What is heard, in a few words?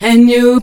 AND YOU VOX, Funky Vocals, SHOUTS; USB Soundscan vol.18